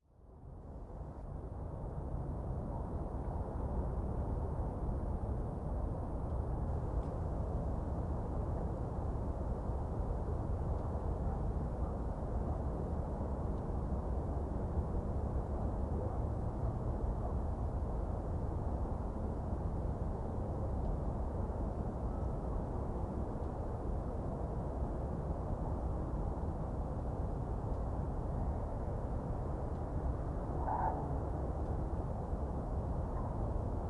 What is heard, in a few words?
distant city ambience far distance city rumble traffic distant siren autumn; sonniss gdc